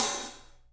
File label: 3D Percussives, Studio Kit; E-MU Producer Series Vol. 5 – 3-D Audio Collection